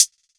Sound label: HITHAT, Closed Hats